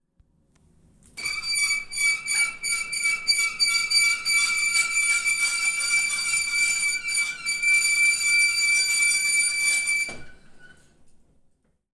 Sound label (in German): Dachfenster; Steinmaur